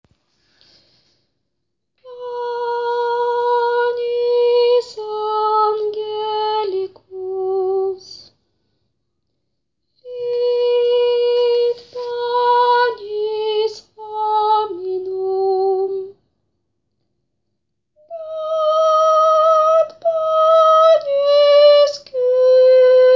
Panis angelicus sopranes

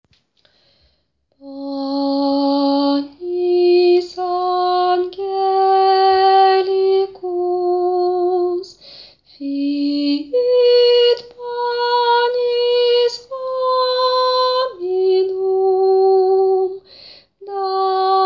Panis angelicus ténors

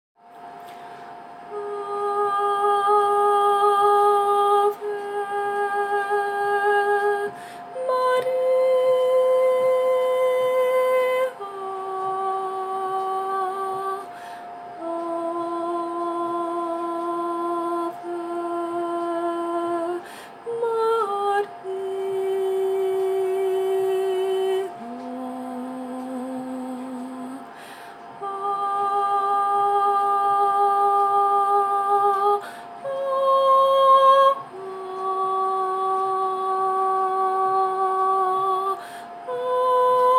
Ave Maria soprano

